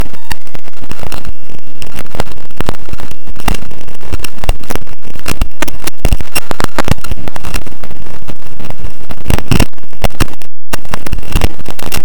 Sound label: Glitchy Vinyl